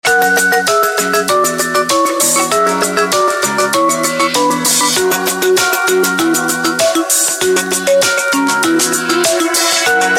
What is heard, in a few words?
Easy Melody; Samsung GT-M7500 Armani